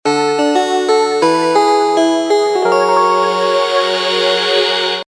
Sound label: Message Alarm, notifications; SK telesys SK-S150